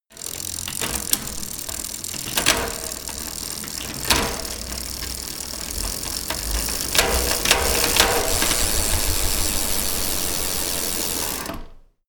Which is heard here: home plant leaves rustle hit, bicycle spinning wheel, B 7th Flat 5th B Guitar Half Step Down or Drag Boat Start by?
bicycle spinning wheel